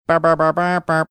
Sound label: singing few notes theme song; heathcliff